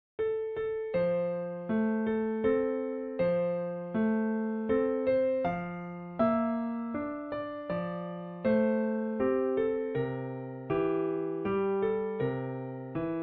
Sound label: lullaby wiegenlied piano; brahms